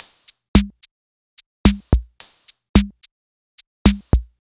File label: Disco 2 B